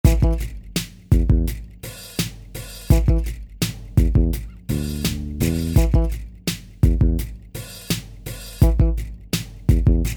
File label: melody